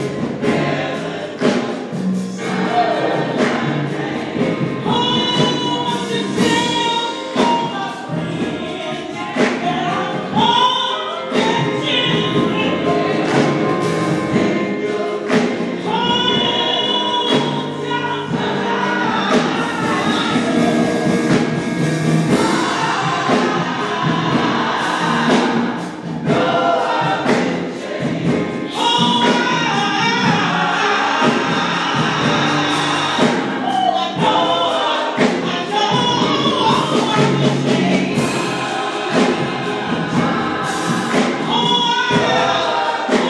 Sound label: meditation song after communion at catholic church; st anthony of padua; atlanta